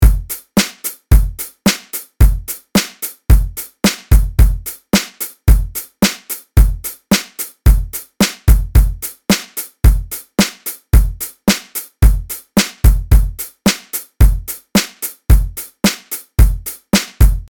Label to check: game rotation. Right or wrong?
Wrong